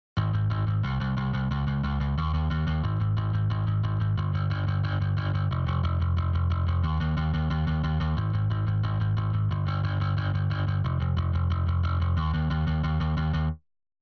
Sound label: bass off